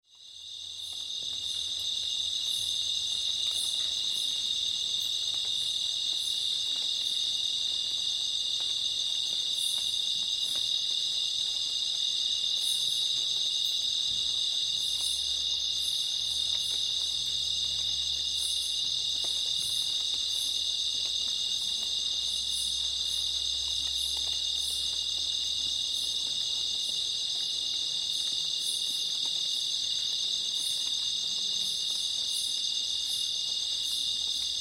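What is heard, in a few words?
sounds of dew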